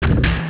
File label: ship; spaceshuttle